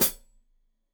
Hat Foot, Hi-Hat, Cymbals Stereo

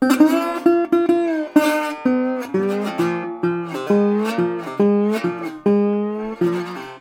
120, Blues Guitar; ILIO - Fretworks

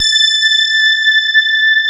SPARKLES; Wizoo - Powered DX

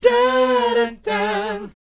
BOYZ F, Vocal Planet R&B & Dance; ILIO

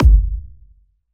kick verb

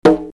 LINN PEC

